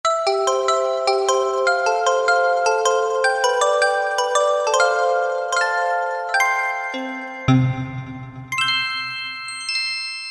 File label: Digi-Bells, Korg M1; Factory preset examples